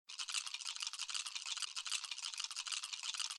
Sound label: Dice Roll; Realm Saga